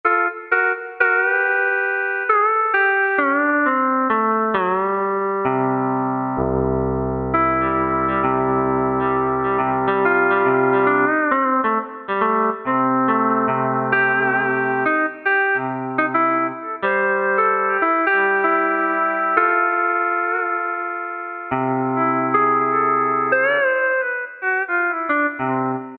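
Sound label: Pedal Steel; Yamaha A4000; Guitar - Bass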